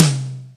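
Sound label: Ambient Tom Mid, Acoustic Tom, Yamaha DTXpress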